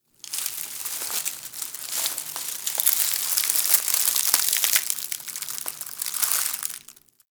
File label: Tree Crackle; Ambience; Interior; Warframe; Orokin Derelict